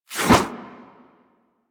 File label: Sentient Brachiolyst Melee Whoosh; Warframe; Sentients